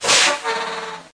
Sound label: air brakes; Sound FX Real Life